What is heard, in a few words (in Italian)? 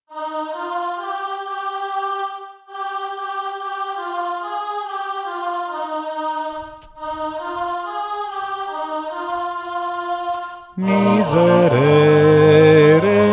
Agnus Dei tenori